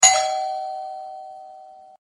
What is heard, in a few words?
door bell; ion sound